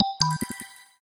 Meteor, notifications; Samsung Galaxy S23

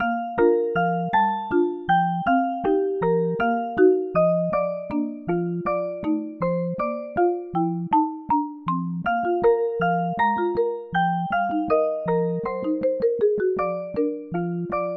ringtones; Galaxy; Baby Bear

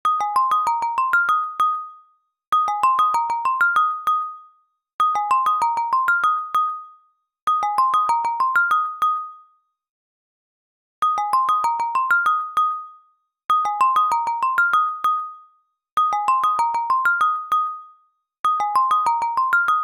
Simple Motive, ringtones; LG; VELVET 2 Pro

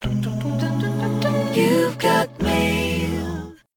Acappella; LG GW525G Etna 3G; Message tones

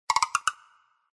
center, Keytone sounds; KU990i Viewty

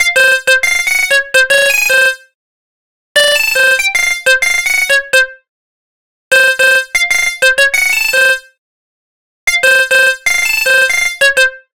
ringtone; Google; Android Open Source Project

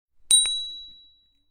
Email, Nokia alert tones

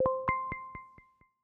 Spatial Profile Enrollment Completed; Apple; iOS-18

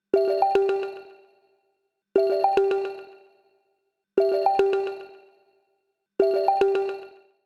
ringer pop pop, ringtones; Mozilla Boot2Gecko

